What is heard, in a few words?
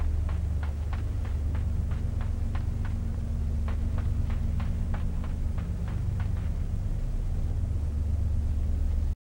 stone; Limbo; perception; gamesoundopedia